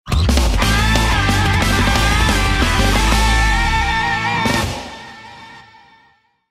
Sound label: Victory Theme; Fatal Fury Series